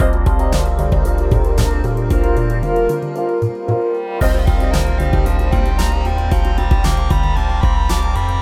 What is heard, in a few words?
Fill